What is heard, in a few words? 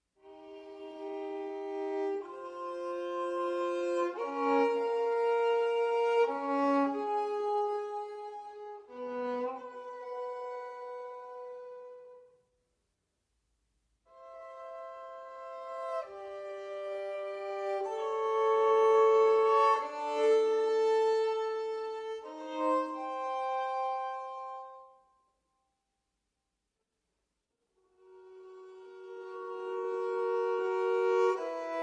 For Violin Alone